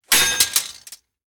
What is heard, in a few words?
sword crack